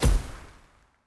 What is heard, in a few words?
Automatic Weapon Medium High Reverb One Shot; mighty party 2017 gamerip pc ios android